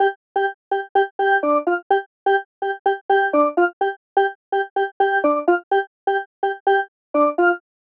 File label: Synth Loop Dry Amin 126bpm